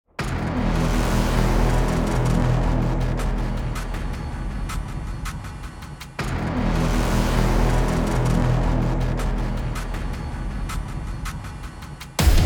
Bells Resounding Madly; Attack on Titan 2

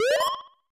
Slot Start; Hisshou 777 Fighter II; SNES; Paropicana7X

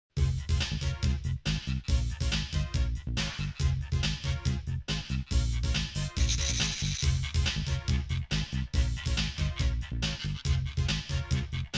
Frog Beat; Zelda The Wand of Gamelon; Washubi Swamp

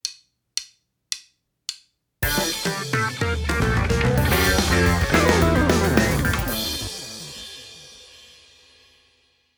Blast Off Stinger

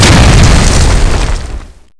explode rocket

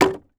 IMPACT Wood Plank On Wood Pile Short mono; Infernal Radiation

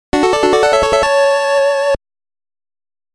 Fanfare (Level Up); fire emblem gaiden nes

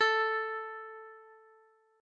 A Elec Guitar; Notes; Scratch

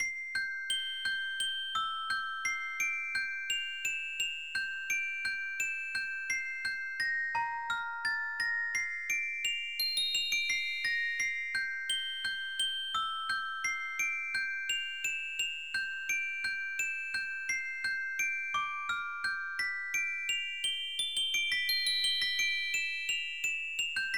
Music Box; Azure Dreams; psx

